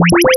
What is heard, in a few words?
AM SINE